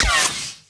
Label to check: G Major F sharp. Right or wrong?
Wrong